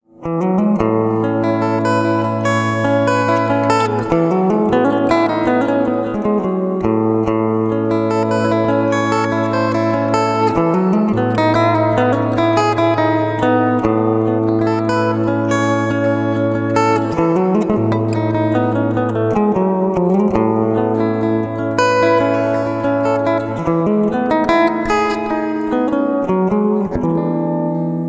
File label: Acoustic